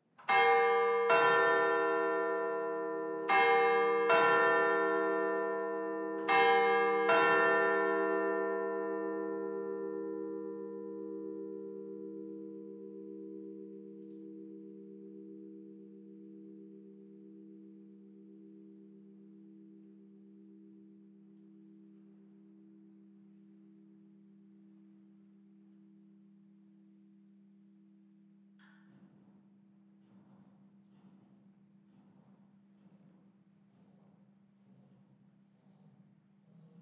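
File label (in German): vollgeläut, Glockengeläut der Stadtpfarrkirche; Stadtpfarrkirche St. Peter und Paul; Vollgeläut Lindenberg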